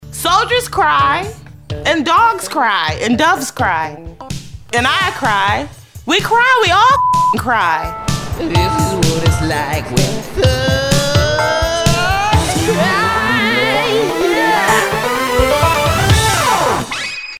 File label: cry